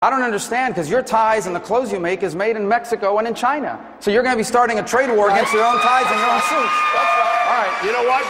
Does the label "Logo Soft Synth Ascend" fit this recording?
No